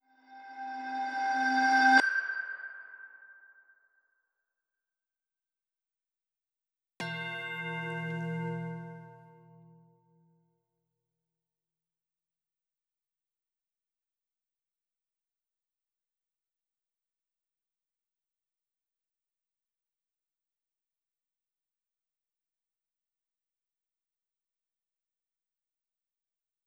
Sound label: Moisture Deficit Synths 120